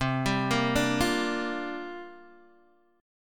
C 7th Suspended 2nd Suspended 4th C down Guitar Open D